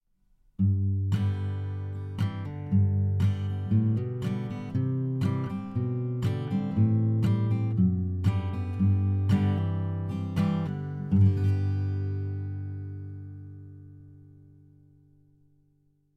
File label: Acoustic Guitar; vanguard